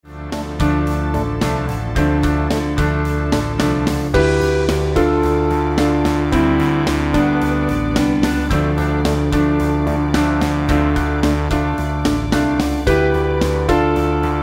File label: Fusion Jam Improvise With D Dorian Modal Scale, guitar